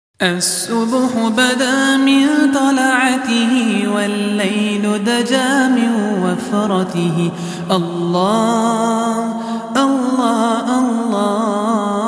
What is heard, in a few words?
best aribic naat tone